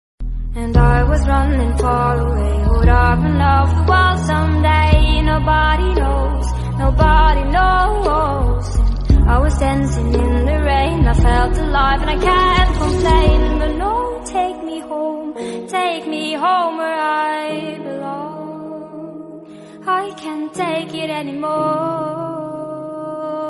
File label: english song tone ringtone